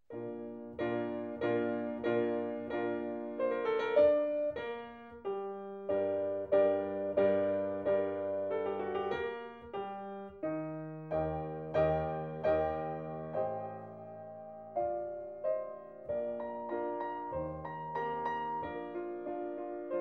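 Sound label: soprano; benedictus; schola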